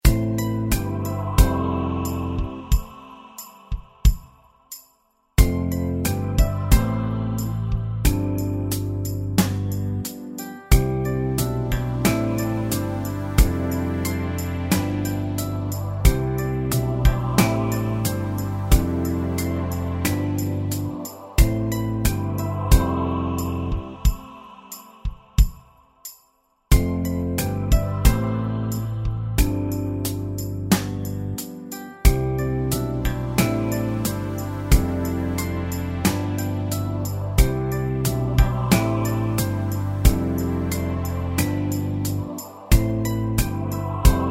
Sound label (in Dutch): instrumentaal